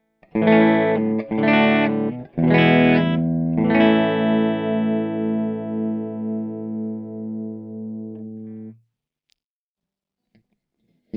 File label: test rg420 clean, ibanez; spider